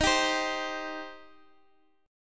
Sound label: Eb 6th Eb down Guitar Open D